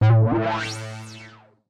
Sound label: KEY SHOT TEKNO 140 A; Techno2; Singleshots